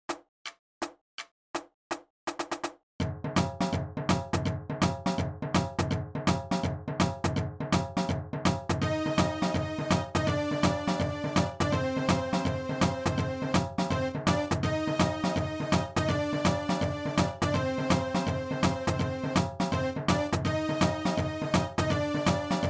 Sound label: Hey Cheer, Marching Band